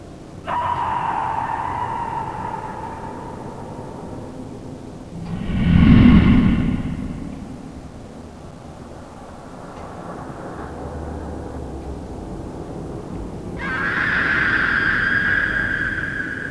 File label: horrorscape